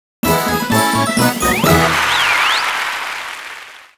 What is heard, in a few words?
Jingle, Stage Clear; Pig Medal; Wii U